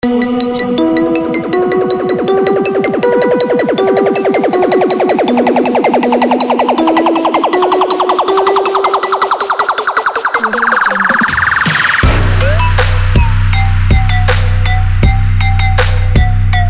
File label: power 808 trap